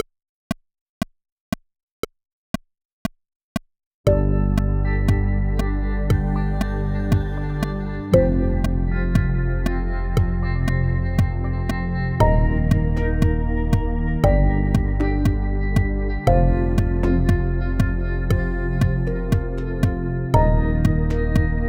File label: Mystery Solved with click - bongo track